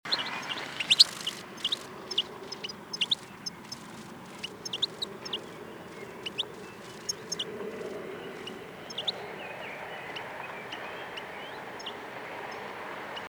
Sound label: Carduelis carduelis; SETE